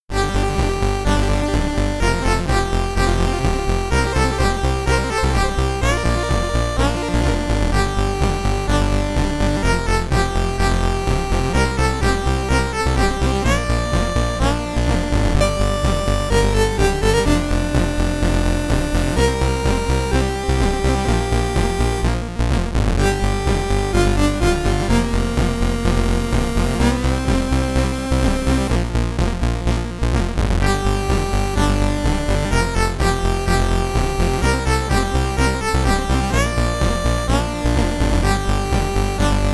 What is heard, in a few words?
Title Screen (SID Stereo); Kendo Warrior; Commodore 64